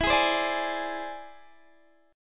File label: E Augmented 7th E down Guitar DADGAD